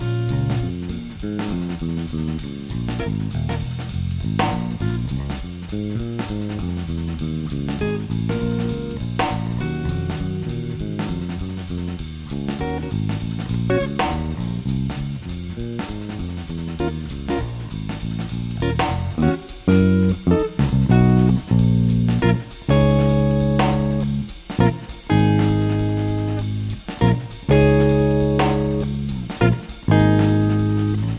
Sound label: Blues solo loop